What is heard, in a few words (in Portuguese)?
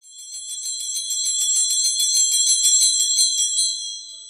carrilhão 7 sinos; cordis paramentos e objetos liturgicos